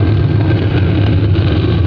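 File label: stone slide, doors; HEXEN2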